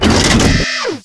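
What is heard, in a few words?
turret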